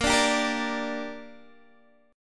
A sharp 6th, Guitar Open D

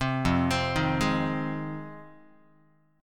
F Minor 7th F Guitar; JGuitar

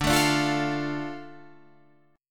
D Minor D Guitar Half Step Down

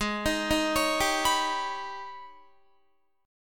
G sharp Minor Major 11th G sharp, Guitar; arpeggiated; JGuitar

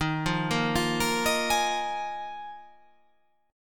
D sharp Suspended 2nd Suspended 4th D sharp; x,18,15,15,17,17,17,17; chordshape

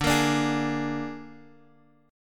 G sharp Suspended 2nd Flat 5th D, chord

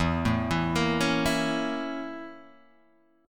A sharp Minor Major 7th Flat 5th

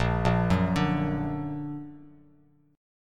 Bb Augmented Bb, Bass Standard; arpeggiated; JGuitar

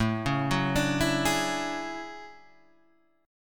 D-Major Sharp 11th, A

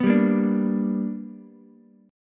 B Minor Major 7th Sharp 5th B, Ukulele; BmM7#5 strummed; 4,7,6,5; JGuitar

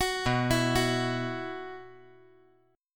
B Suspended 4th

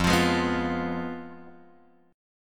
F Diminished, guitar chord; Fdim Chord {1 X 3 1 0 1} strummed

